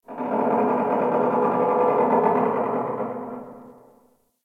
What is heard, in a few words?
Pipe Creak One Off; Ambience; Warframe